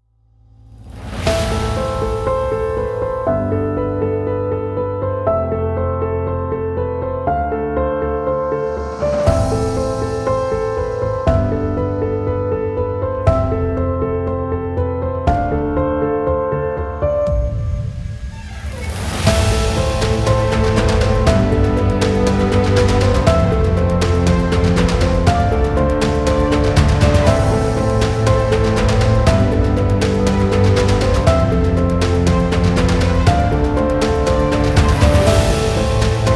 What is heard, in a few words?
Epic Drama